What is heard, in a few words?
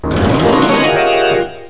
rev